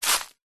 foley footstep single soft shoe dry leaves forest floor; zapsplat